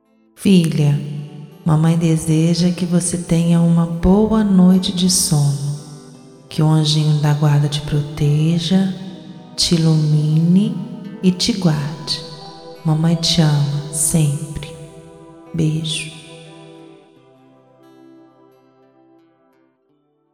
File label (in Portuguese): ringtone dedicatoria sonhos de ninar